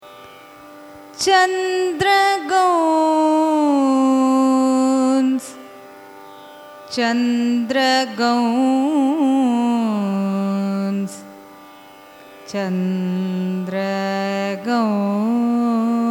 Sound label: raga chandrakauns signature; carnatic music